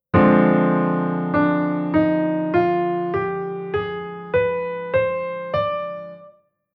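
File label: Chord Scale Minor Harmonic Minor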